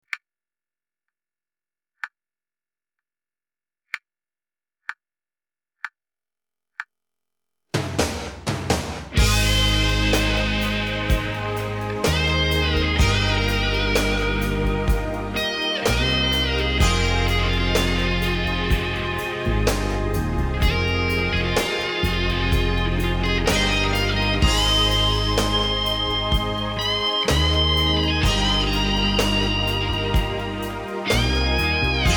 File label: drums